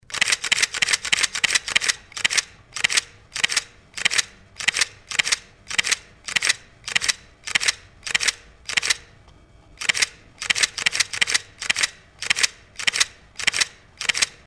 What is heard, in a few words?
pentax km; K2000 JPEG Lexar